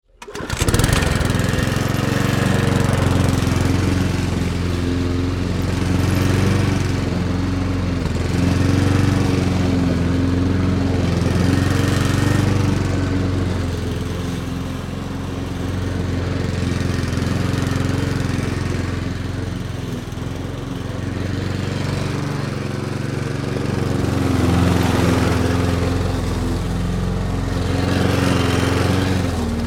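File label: Lawnmower Petrol - 4 stroke petrol - pull start - mowing grass - off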